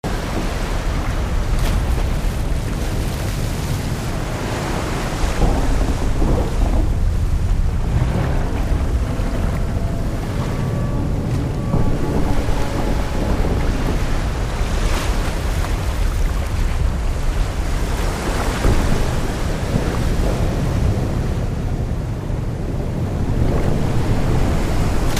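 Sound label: Ambience Earthquake Sinking Monument Exterior Loop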